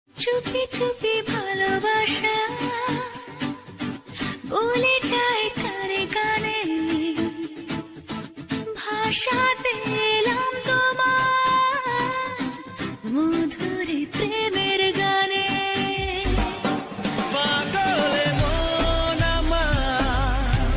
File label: Bengali; ringtones